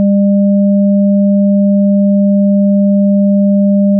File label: 200 600 Sine